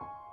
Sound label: piano high